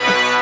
Orchestra

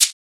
Shaker

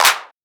Shifted Clap; FLPRO

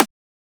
Transient; FLPRO

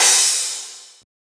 Crash

